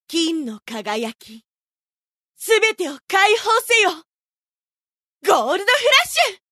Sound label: effort